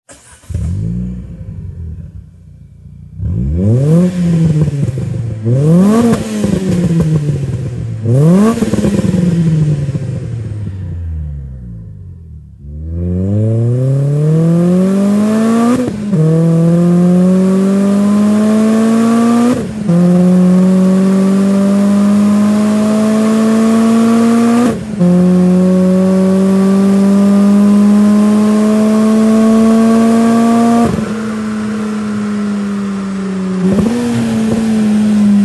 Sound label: Evolution OPEN Renault Clio III RS 200, Link pipe SET Akrapovic; phase 2